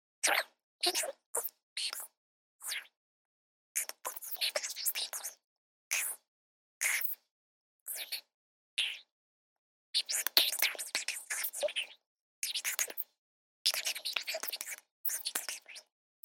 asmr

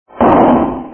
gavel

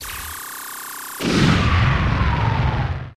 bomb

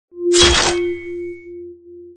attack ping

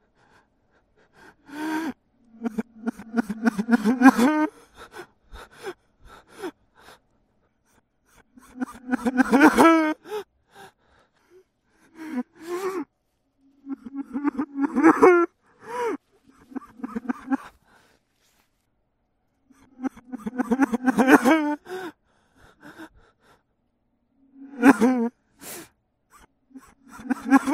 cry, reverse

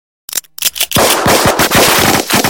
gun

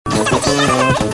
trees

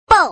bowl

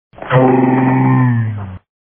doh

